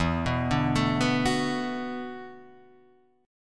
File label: E 7th Suspended 2nd Suspended 4th, down; E7sus2sus4; arpeggiated